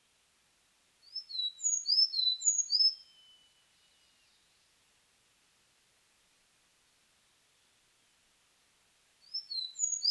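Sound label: Sakhalin leaf warbler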